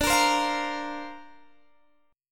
D-Minor 7th Sharp 5th D Guitar Drop D, chord

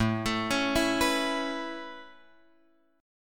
A Major Add 9th A, chord; Aadd9 Chord {5 X 7 6 5 7} arpeggiated

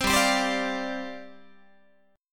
G 7th Suspended 4th G down Guitar

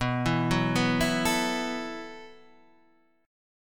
B 7th Suspended 4th B

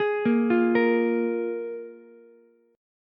F Minor Double Flat 5th F Ukulele; Fmbb5 arpeggiated; JGuitar

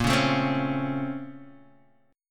Bb Minor Major 9th Bb down Guitar Open D

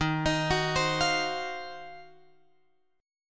D sharp Minor 6th Add 9th D sharp, chord

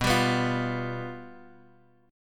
G 7th B

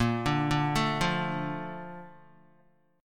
Bb Major 7th, Guitar; BbM7 arpeggiated; JGuitar

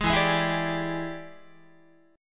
E 7th Sharp 9th Guitar, chord; E7#9 strummed; JGuitar

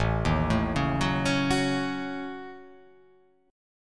A 6th Flat 5th, guitar; A6b5; arpeggiated; A6b5 chord; JGuitar

